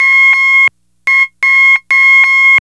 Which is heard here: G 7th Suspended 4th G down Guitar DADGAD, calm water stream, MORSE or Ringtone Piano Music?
MORSE